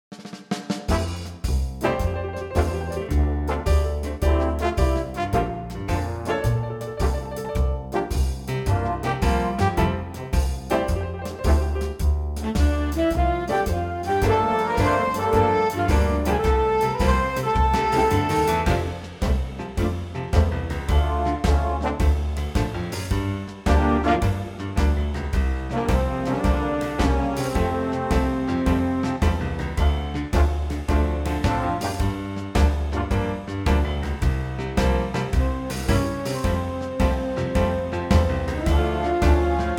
Big Band F

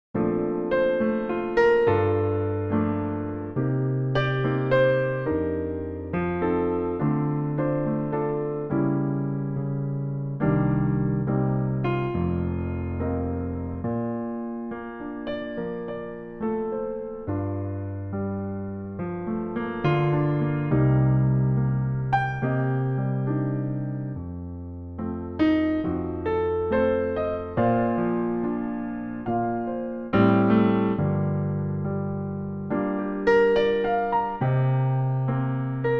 Bb piano

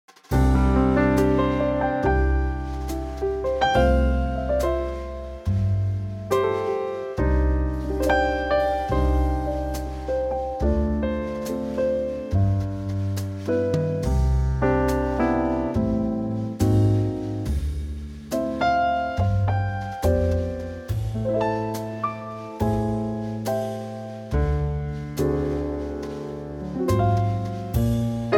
C Trio

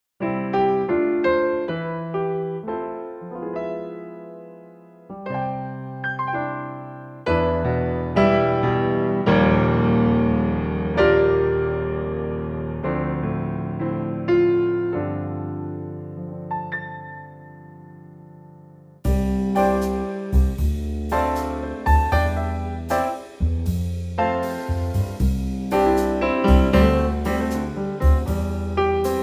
C Trio

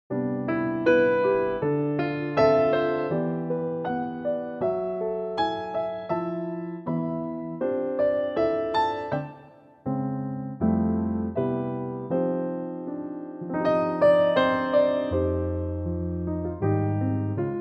C Piano

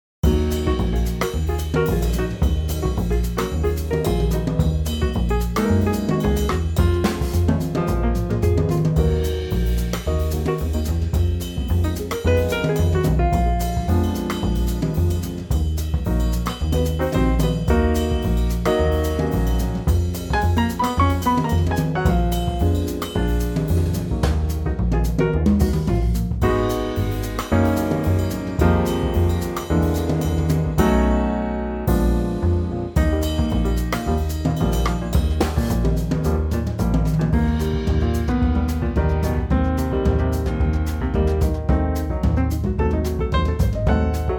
Eb Latin Trio

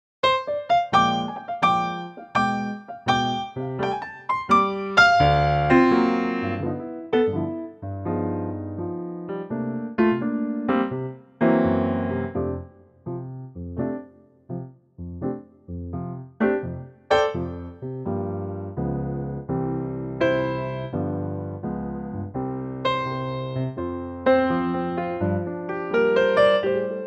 F piano

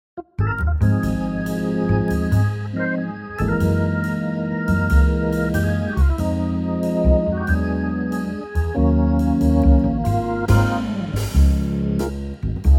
Db Organ Trio, male

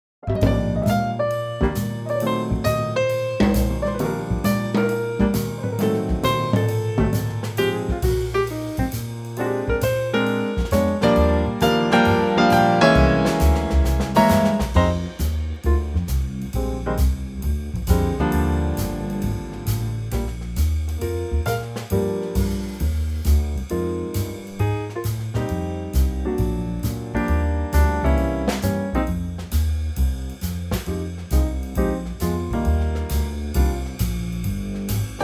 Eb Trio

